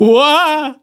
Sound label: voice male critical